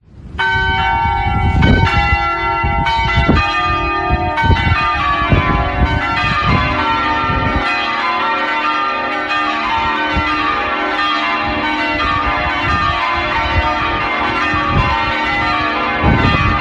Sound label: Ringing Up, church